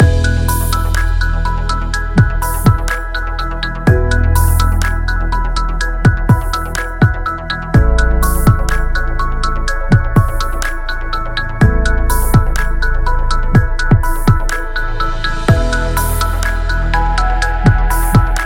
Minimal Loop; service business

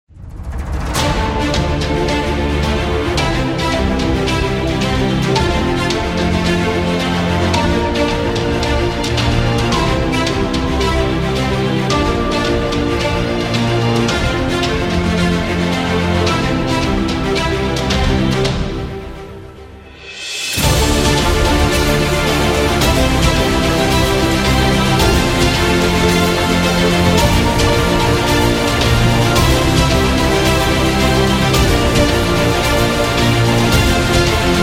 Trailer Cinematic; documentary

